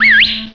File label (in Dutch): fluiten